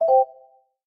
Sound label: voice awake; LG Wing 5G Verizon